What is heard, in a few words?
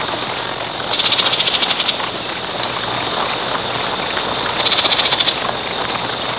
Bird chirping cricket ambience